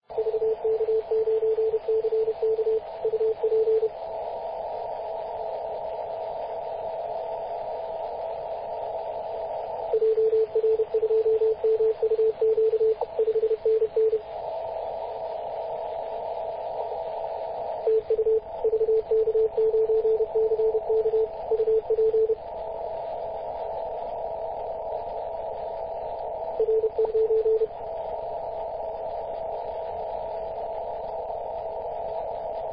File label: 21.032MHz CW; vk9ck